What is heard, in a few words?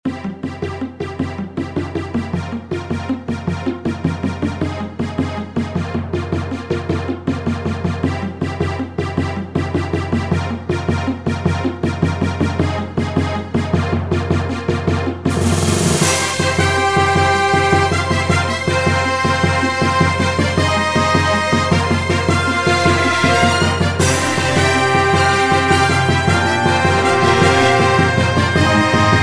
Melody For Whistle With Orchestra